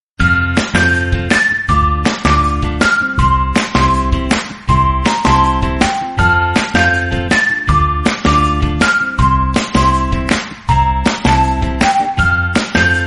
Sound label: Background Music Instrumental